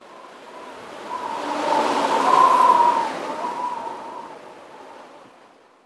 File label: single gust of wind